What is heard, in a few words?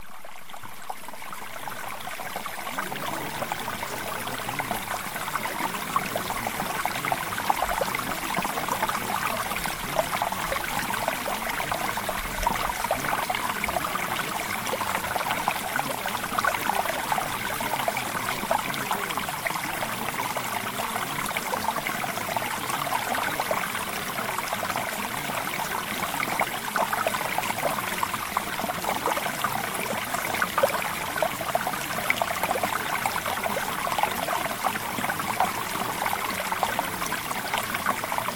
Mountain Stream; Remove Subconscious Blockages